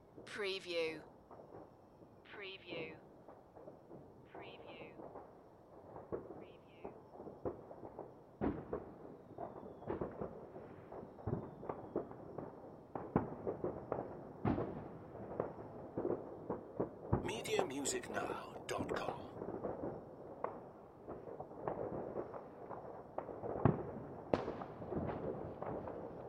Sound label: New Year Fireworks - Build-up; beanstalk audio